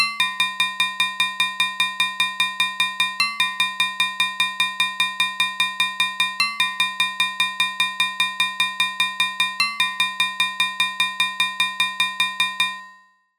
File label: Semiquaver